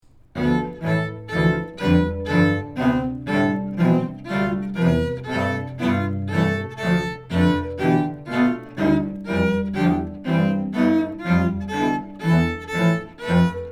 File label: Random Music Generator